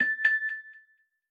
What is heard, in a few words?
keys, message Received Sounds; Session iOS; Signal